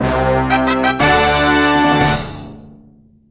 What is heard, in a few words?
Story Clear; New Super Mario Bros. U Deluxe